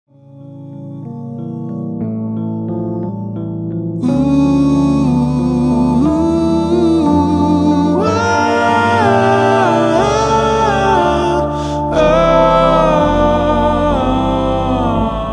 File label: Production Arrangement Vocals Rhodes